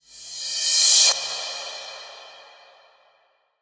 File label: perc rev cymbal